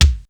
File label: BD KICK; drum; BASSDRUMS